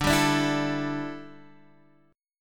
D Suspended 2nd Suspended 4th D, down, Guitar Half Step Down